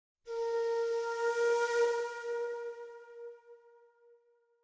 Flute Tone Light I, flute

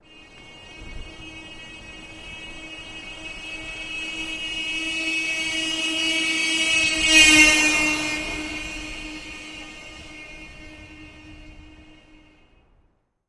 Car Import Doppler Ho, doppler horn bys

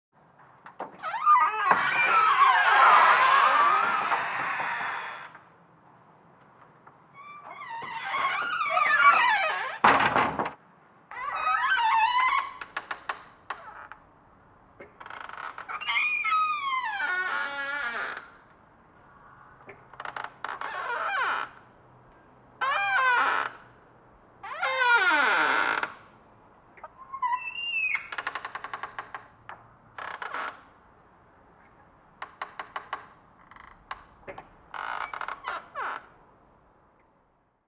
Door Creaks Medium, wood

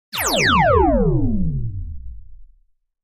Laser Elect Shutdown

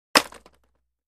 Roller Blading; Single Roller Blade Is Dropped.

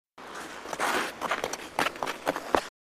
Hockey Fast Dig Run

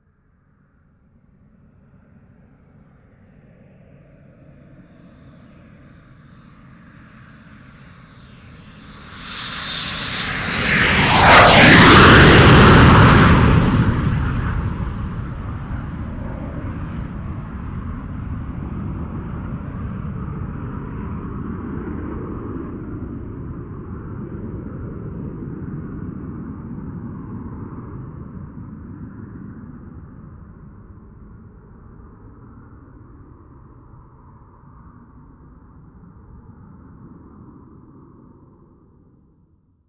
Lear Jet Slow By Very

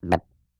Cute Burp; burping

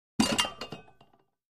Free Weights Exerci

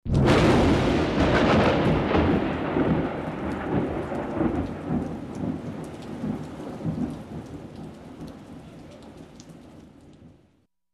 Strong Thunder Clap

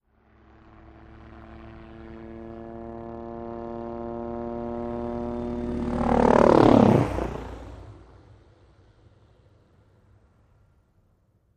OH6 Loach By Fast Ster; little bird

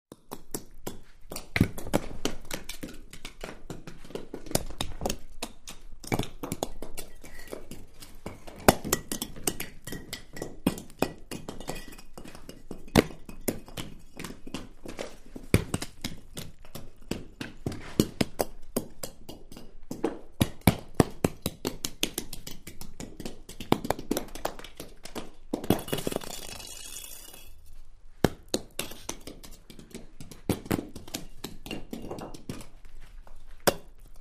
Rocks Bouncing Down, earthquakes

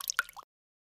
Water Drip Cave; drips

